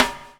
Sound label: BUBL; Spectrasonics - Backbeat